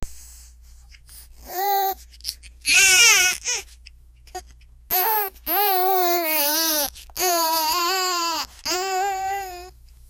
a baby in carrying, lifestyle

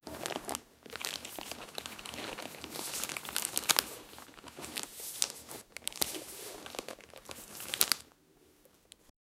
unboxing a luxury bag, velvet

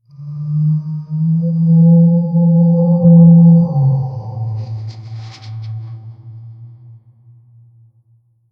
the eerie cawing of a; cobblestone

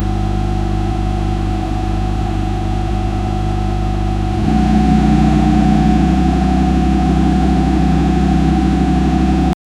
muscle sport car engine

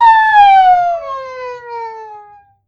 cartoon car accident; accidents

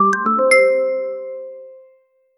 a discret notification; discrete

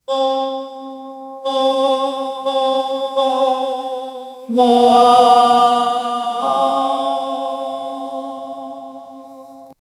angel human aah

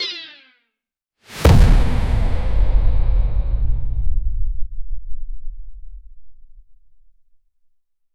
player death fail

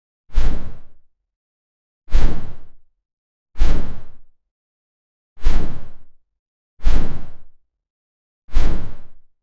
a short clean jump sound, air displacement

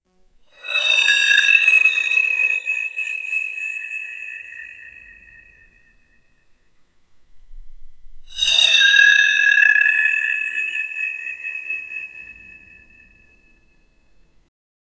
the sound when you took, aura

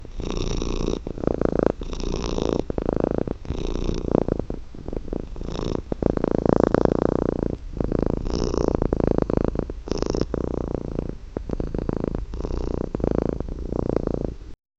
cat cant stop farting, fart

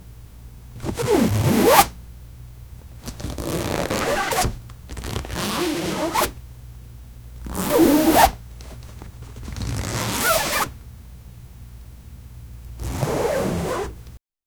searching inside a duffle, bag

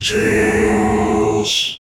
blood shaman chanting, occult

